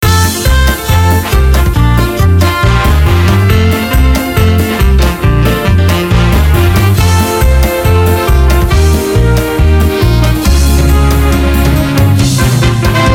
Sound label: free spin melody; Billyonaire